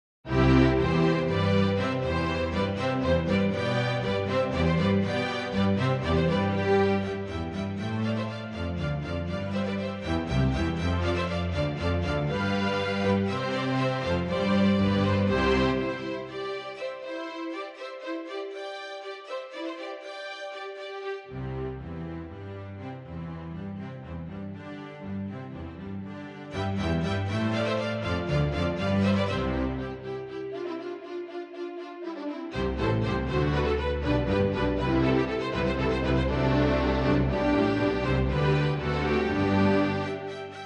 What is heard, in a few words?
Hornpipes